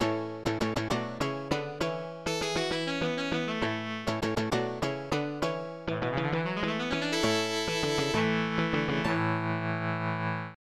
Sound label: Win Game; Championship Pool; SNES